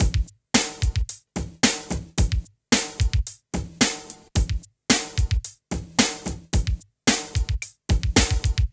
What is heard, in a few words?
Club Tip, R&B Drums